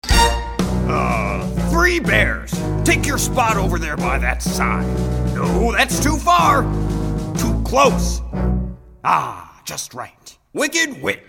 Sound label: Vocals